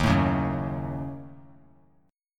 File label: Eb Minor 7th Flat 5th, chord; strummed